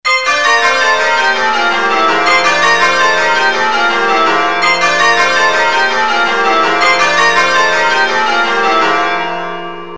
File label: Tittums, changeringing